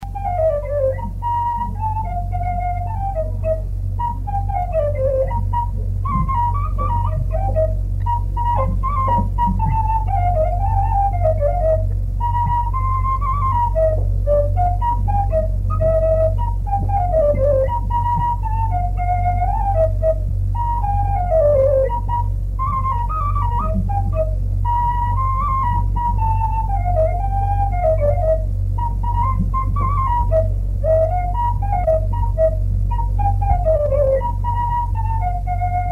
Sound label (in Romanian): Polca dreapta la fluier; jocuri si cantece batranesti; Vaslui